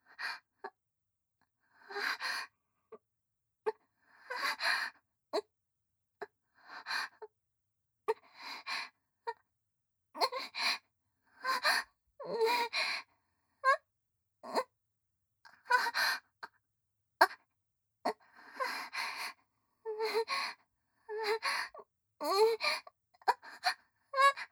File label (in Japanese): 正面・喘ぎ声・普通; リーシャ